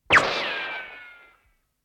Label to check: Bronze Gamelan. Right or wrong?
Wrong